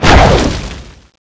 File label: whip sword slash loop